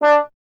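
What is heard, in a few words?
bass trombone D; Miroslav Vitous; Solo Instruments VOL-1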